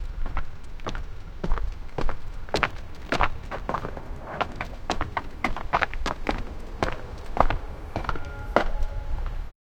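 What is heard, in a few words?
corridor ambience footsteps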